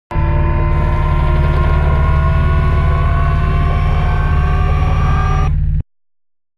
Giant Floor Panel Assemble Final Lift Servo; Boomtown; Sideways Town; Titanfall 2

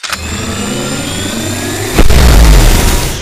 arc cannon chargeup fast; Titanfall 2

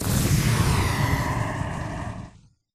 boost jet body; Titanfall 2

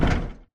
bt footstep jog parts; Titanfall 2

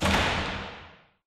radar tower stop clunk; Titanfall 2

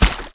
Mvmt FS Jog Mud Layer; AI Stalker; Titanfall 2

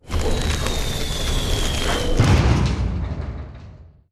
Delivery Cage Slide In; Titanfall 2; Boomtown

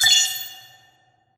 minimap incoming units ping; coop; Titanfall 2

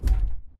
footstep jog; Titanfall 2

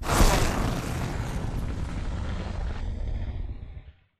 boost generic end; Titanfall 2

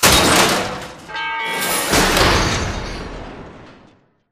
generator destroyed metal close; coop; Titanfall 2

